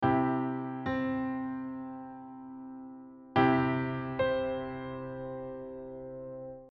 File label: diatonic chordtone